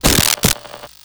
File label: headset impact, radio; props; alex radio